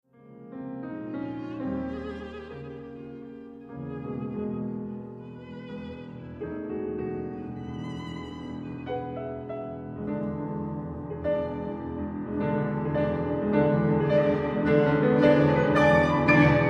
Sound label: Quintet; Fibich